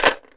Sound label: ELM RATTLE, PERC; AKAI S6000 CD-ROM - WorldSounds